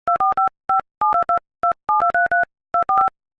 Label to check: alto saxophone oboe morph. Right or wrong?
Wrong